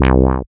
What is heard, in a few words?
SYN MC WAH, Roland MC-202; Sound & Vision - Gigapack I CD 2